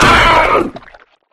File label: combat body destroyed; flood